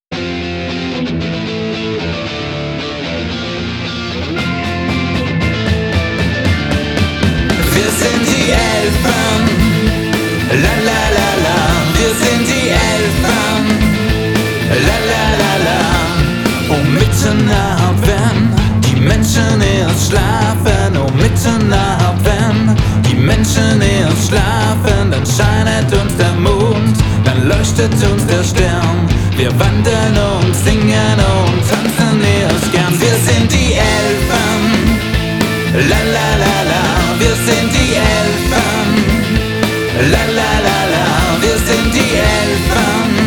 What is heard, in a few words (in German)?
gesang der elfen